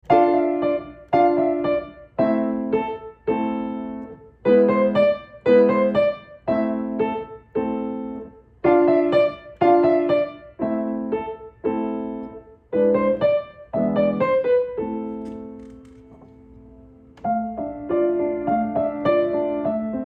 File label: Piano